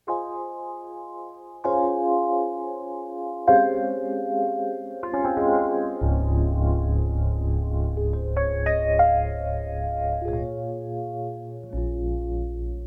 E Pianos

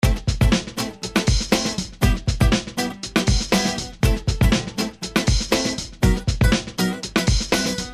guitar acoustic

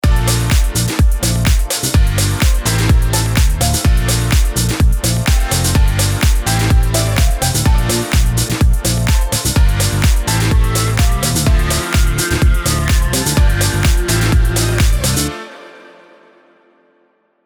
Avenger Funky House Pianos; Avenger Funky House 2; Midnight Funk Pianos

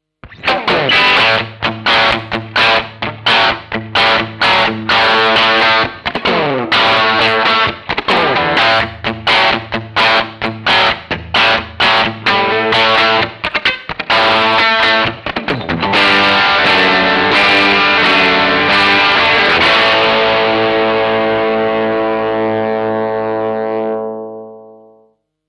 Humbucker Bridge Pickup Crunch

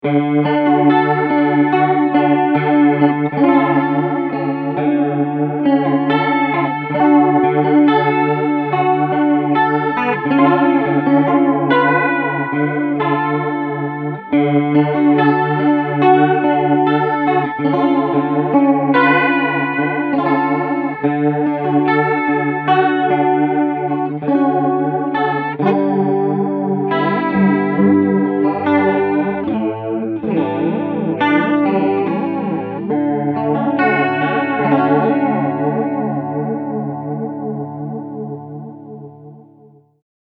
Flanger Rotary Mix

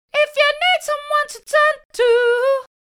Double Take Dub Vocal

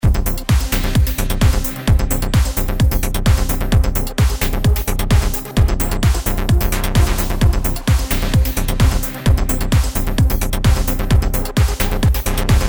Trance

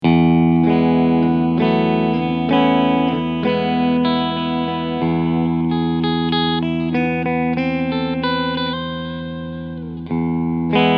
clean sc beide

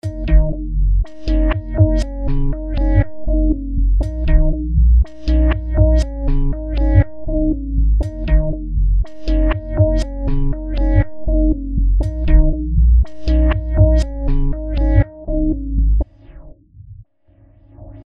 Motion stutter; ShaperBox 2

